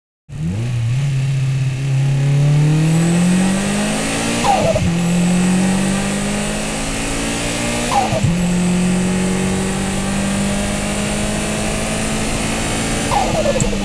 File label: Boost Sound; Initial D 5th Stage Sound File; Initial D Music Lossless Collection